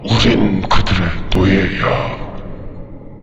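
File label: zombie coming, song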